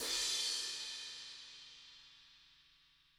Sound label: Cymbal One Shot; Drums